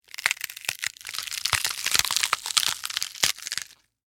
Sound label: Flesh Rip Tear, Bone, Crack; Horror; Blood and Guts; BlastWave